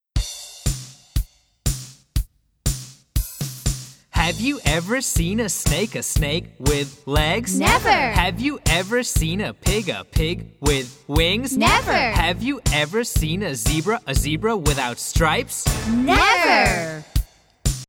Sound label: CHANTS Have You Ever Seen a Snake